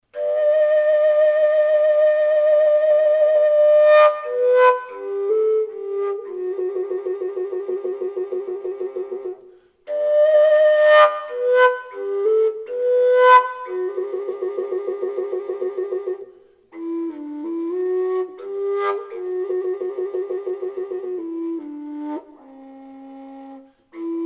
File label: little star shines g, fujara